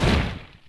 Spike Ball

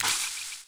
Slide Shallow Water

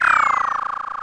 Propeller Suit Drill Down

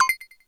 Projectile Deflected; New Super Mario Bros. Wii

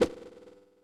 Small Whoosh; Super Smash Bros

